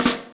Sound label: MARCHIN ROL; 3D Sound; 3D Drums